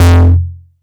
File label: Synths F; Vengeance Samples